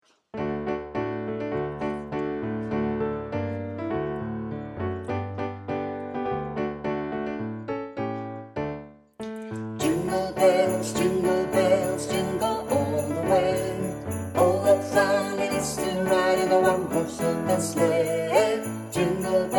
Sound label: jingle bells